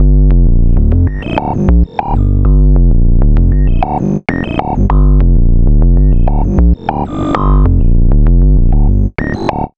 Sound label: Synth Bass; Sonic Foundry; Leo Cavallo Bass Taster